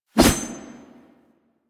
Sword Swipe, Melee Whooshes; Warframe; Weapons

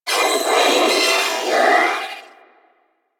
Sentient Symbilyst Death; Enemies; Barks

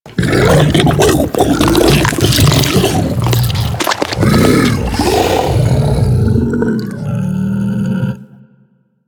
Juggernaut Truffle Eat, Infested; Warframe; Truffle Hunt

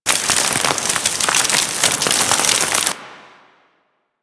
Frozen In Place; Warframe; Grineer Hammer Boss; Ice

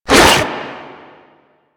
Arm Attack; Enemies; Warframe; Infested; InfestedNinetyNine

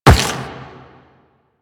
Run Rock, Footsteps; Warframe; Quests; The New War; Kahl

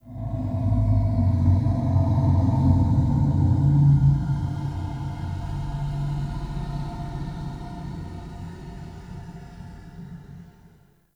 Cave Ocean Creature Far; Ambience; Midrath Island

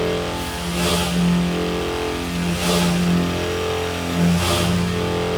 Coolant Machine Loop, Ship; Ambience; Props; Warframe; Corpus Ship Remaster

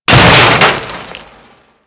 Shipyards Debris Crush; Ambience; Props; Warframe; Grineer Shipyards

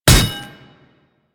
Ghoul Devourer Melee Impact

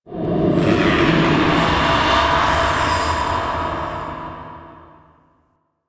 Exterior Jealous Sky Screech

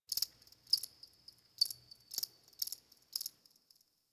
Insect Night; Ambience; One Offs; Warframe; Eidolon Plains

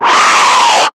Screamer Pain; Warframe; Lasrian

